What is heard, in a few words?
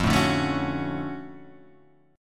D-Major 7th F; DM7/F Chord {1 0 0 2 2 2} strummed